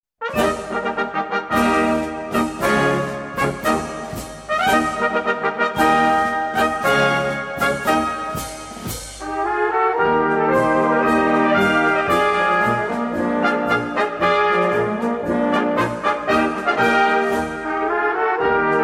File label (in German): bergischer musikanten marsch